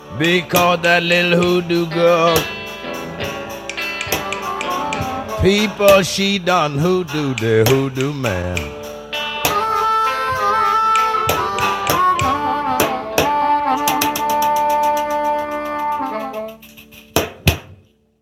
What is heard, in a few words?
Hoodoo blues